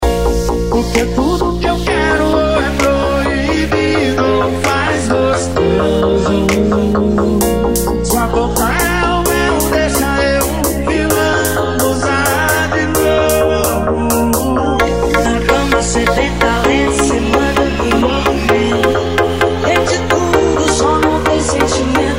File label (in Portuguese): sertanejo remix